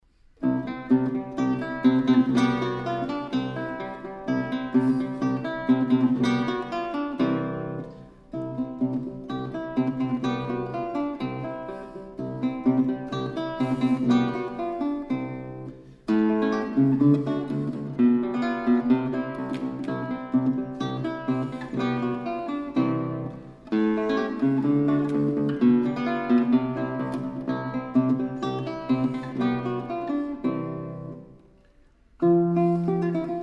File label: guitar; Sonatina No.2 In C Ms85; Passo Doppio; Corrente; Trio; Paganini